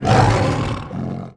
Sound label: troll tree